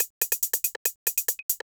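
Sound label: HIHAT ELECTRO 140, Techno Drum; Techno2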